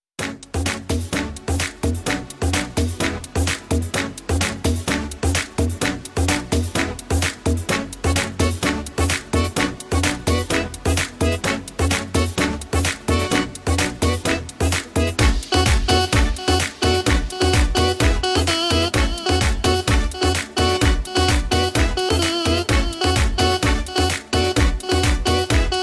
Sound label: Bg Rhythm